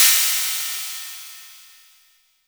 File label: Cymbal